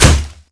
bow; garrysmod

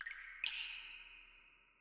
sewer drip; garrysmod

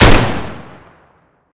new aug; garrysmod

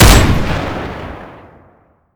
nail; garrysmod

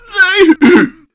zombi idle; garrysmod

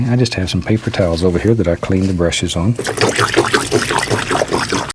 paper towels

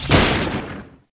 arc; garrysmod

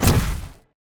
warp; garrysmod

